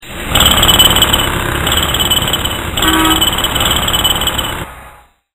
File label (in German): Presslufthammer